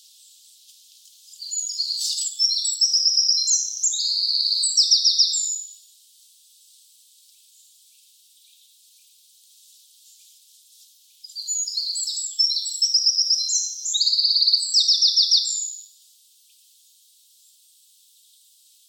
call, wren, full song, adult male, Nuthatch on background.; ALTITUDE: +165 m; troglodytes troglodytes